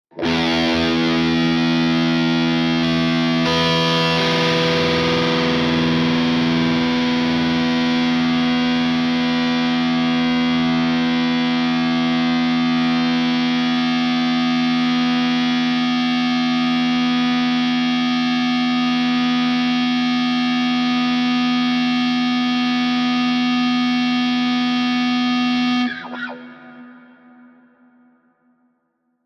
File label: Elect Guitar, electric guitar